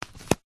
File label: wallet close; foley; zapsplat